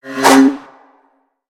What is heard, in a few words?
Sword; Lego; Strombie; creatures organic